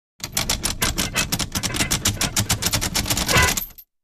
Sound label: Drawbridge Ratchet